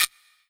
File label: Korg N1R Short Guiro